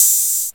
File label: open hi hat sound C key, open hat sample tuned to the C note. Loudest frequency: 8893Hz